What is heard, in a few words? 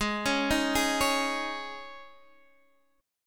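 E flat 7th Suspended 4th, A flat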